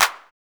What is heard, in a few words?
808 CHZ CLAP; Drums In Your FACE!; CLAPS